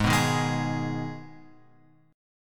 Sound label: G sharp Minor G sharp, chord; G#m Chord {4 2 1 1 X 4} strummed